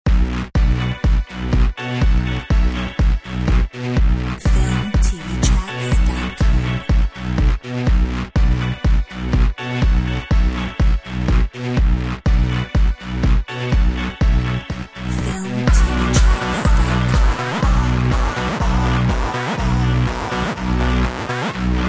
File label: dance